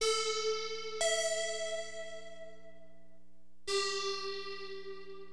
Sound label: TIMEA, HipHop Keyboards